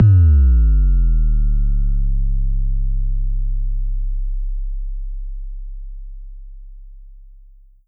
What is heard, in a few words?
Kicks, Elektron MachineDrum SPS1 MKII